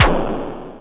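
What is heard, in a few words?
Pit Snare; Quasimidi Rave-o-lution 309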